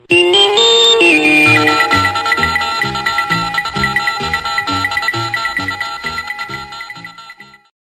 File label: News Sounder 1969; CBS